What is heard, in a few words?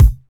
studio kick sample a key, kickdrum sound tuned to the A note